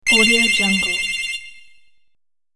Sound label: powerup game